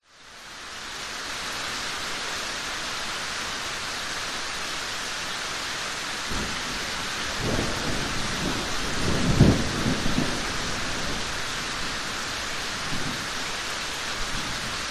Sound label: nature rain thunderstorm approaching rain drips yard